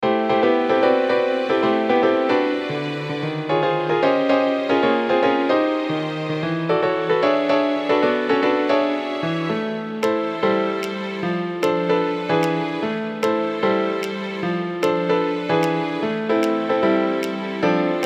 instr

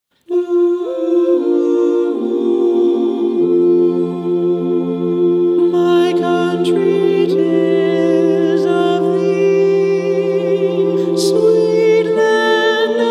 chorus version

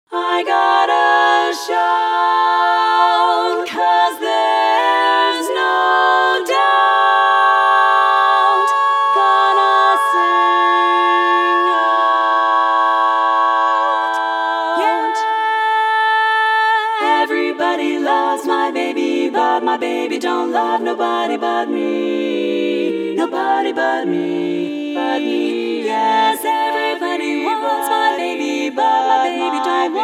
bell chords

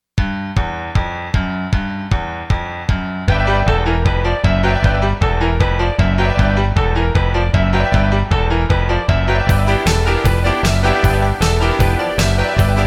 accomp track

